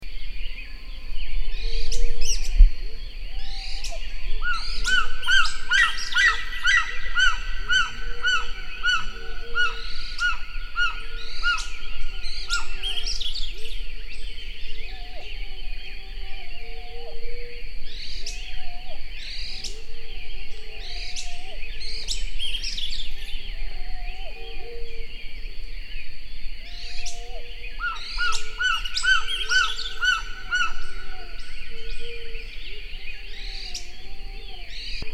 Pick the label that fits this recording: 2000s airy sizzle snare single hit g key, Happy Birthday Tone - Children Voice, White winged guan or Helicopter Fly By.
White winged guan